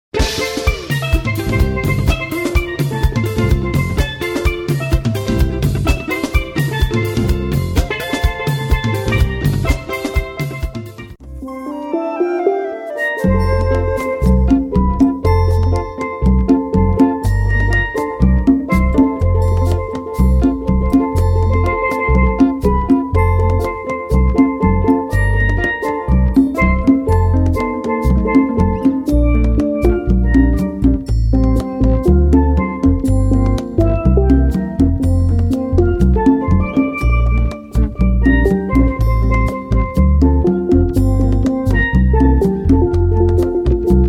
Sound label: Steel Pan